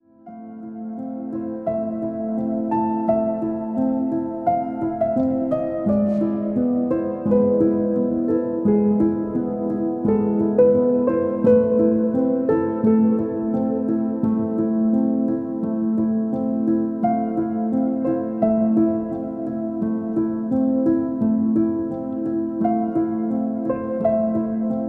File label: Instrumental Biolateral